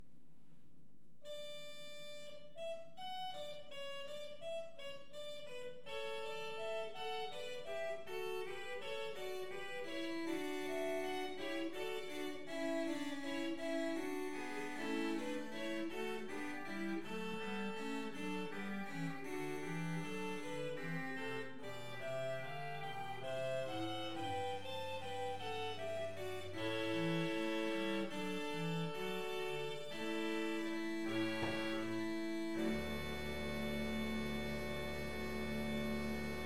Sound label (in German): D Dur Fuge; mozart